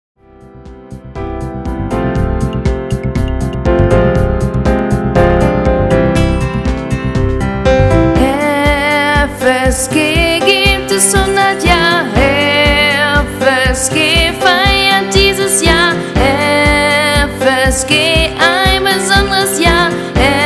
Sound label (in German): Jingle Projekt; steinhundert